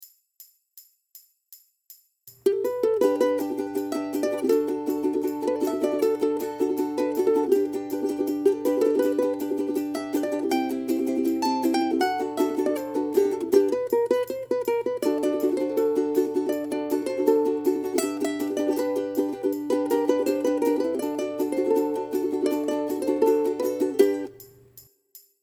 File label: Fiesta Solo, ukulele